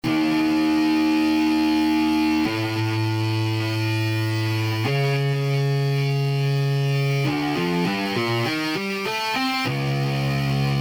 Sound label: T2 LPC broken Amp